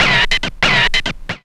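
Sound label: SCRATCH; E-Lab; X Static Goldmine 1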